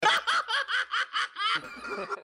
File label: laugh